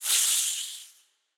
Snake Hiss Short Dry

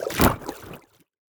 Object Wet Dip

